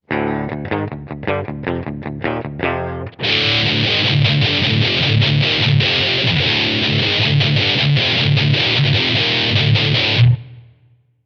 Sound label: solid metal; Damage Control